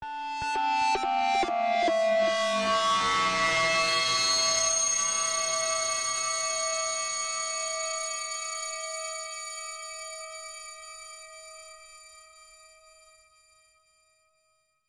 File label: descending guitar ships; Atmospherics